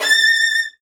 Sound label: Virtuoso Strings A4; ILIO; Kirk Hunter Virtuoso Strings VOL-1